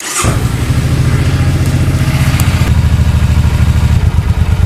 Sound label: suzuki c40 start, motorcycles; mono mic